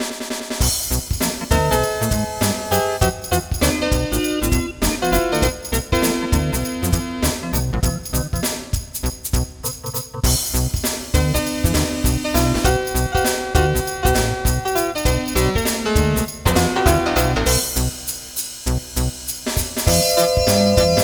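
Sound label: background music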